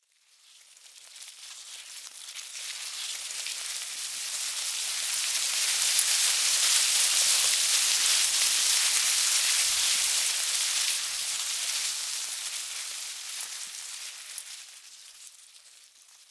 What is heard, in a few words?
Ants Army, Animal, Ants, Army, Bugs, Movement, Approach, Leave, Colony, Herd, Insects